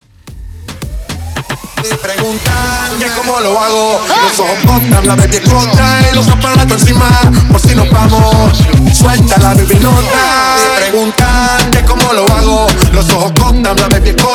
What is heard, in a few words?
Acapella Break Intro Club Reggaeton 110 BPM